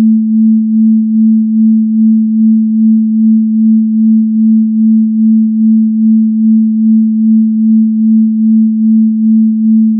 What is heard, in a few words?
a tone